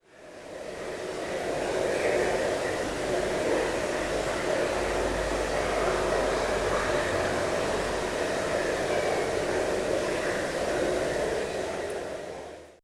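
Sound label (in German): plätschern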